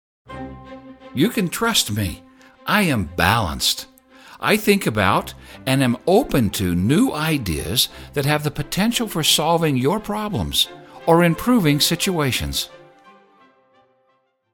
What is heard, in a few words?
music; Analytical balanced